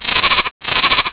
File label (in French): agneau